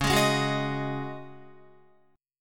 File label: C sharp Suspended 2nd Suspended 4th, Guitar; C#sus2sus4; strummed; 8,11,8,11,8,11